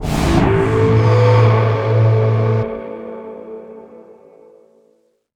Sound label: Combo Sting; Dead Rising 3 Apocalypse Edition